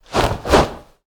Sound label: Wing Flap Medium Demon Attack Underlay